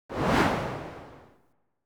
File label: Low Swoosh; ActivStyle effects